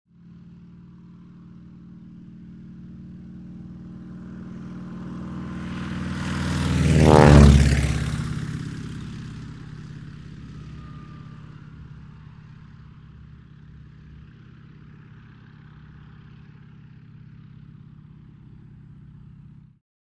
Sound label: Broussard Runway By